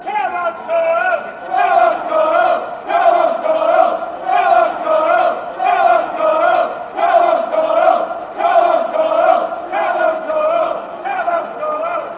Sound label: come, chants; carlisle